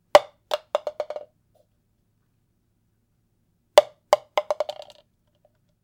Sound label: Crash cup Falls